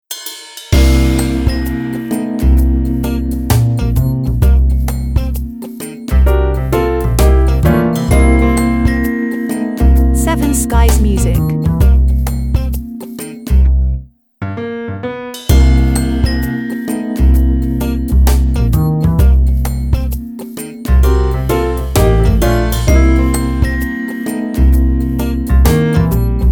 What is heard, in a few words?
Light Comedy